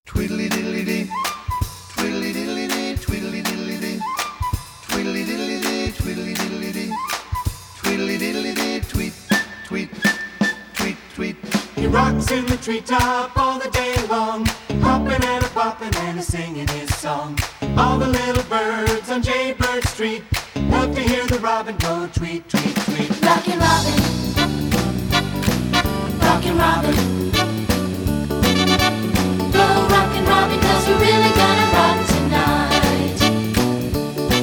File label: recorder rockin